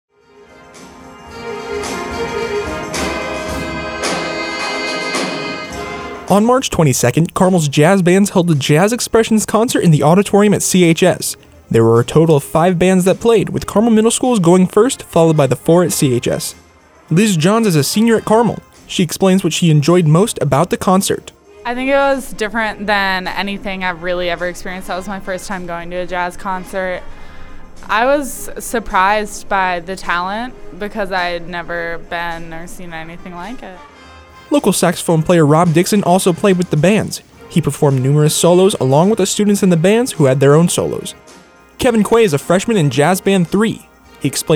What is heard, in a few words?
JAZZ EXPRESSIONS CONCERT BEAT